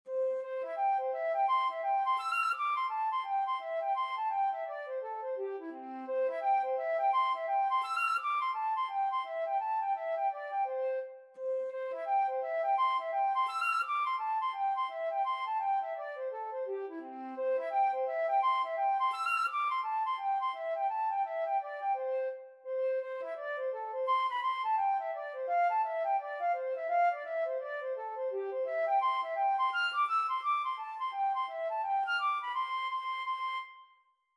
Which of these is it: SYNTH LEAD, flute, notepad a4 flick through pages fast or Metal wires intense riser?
flute